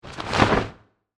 Cape Movement, Horror, Cape, Movement, Fabric, Cloth; Monsters; BlastWave